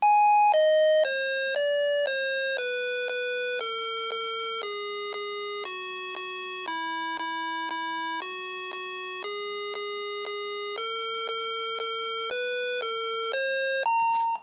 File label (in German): Melodie